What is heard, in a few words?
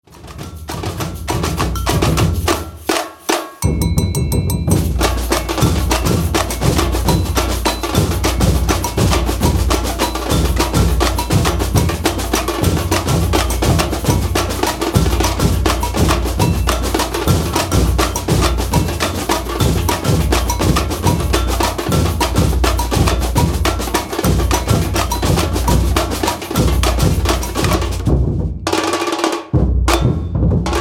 maracatu